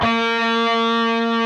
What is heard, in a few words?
PRS lead; Spectrasonics; Hans Zimmer Guitars VOL-1